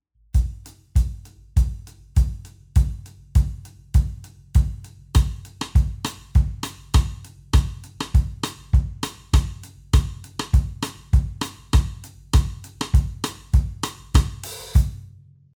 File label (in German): Clave Rythmus; Schlagzeug